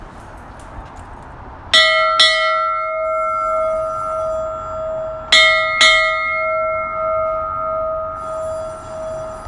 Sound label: Bell